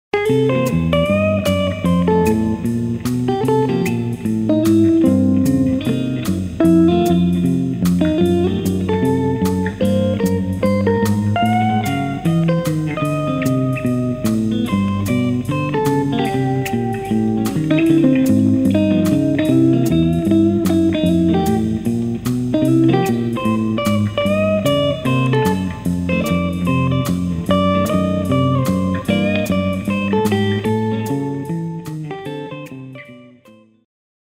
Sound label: C Blues